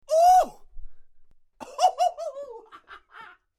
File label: man larking about